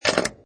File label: Pinball Sound Ball Rattling Objects; Video Games; Lynne Music